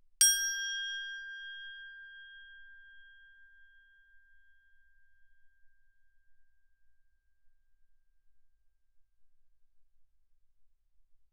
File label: crotale G6 ff; Percussion; crotales